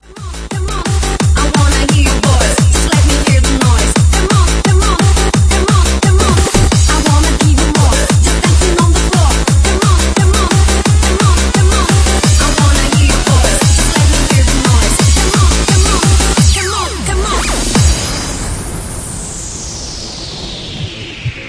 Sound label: acid turbo